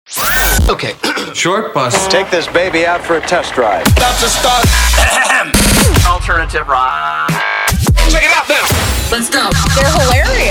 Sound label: Rock Alternative; Short Bus Radio